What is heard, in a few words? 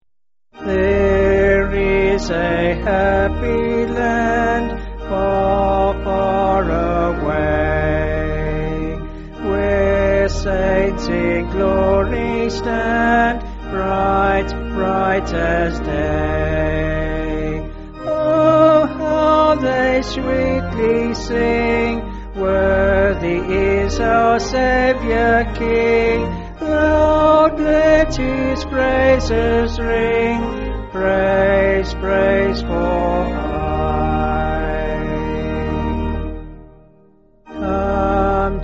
There Is A Happy Land, Happy Land